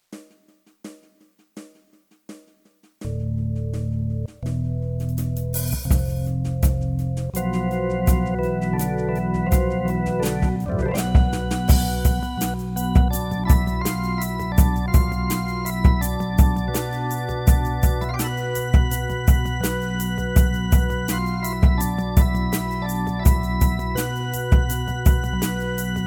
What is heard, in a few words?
Hypoxia electric